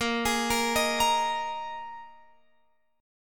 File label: A sharp 7th Suspended 4th A sharp, Guitar, Open F; A#7sus4; arpeggiated; x,17,20,17,18,17